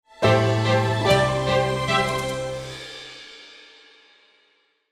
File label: Strings Bright Jingle